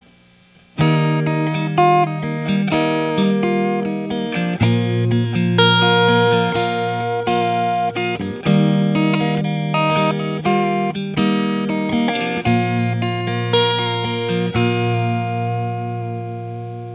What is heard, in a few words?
triangle, chorus vibrato; jhs emperor v2 chorus vibrato; pedals